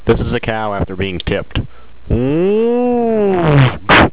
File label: moo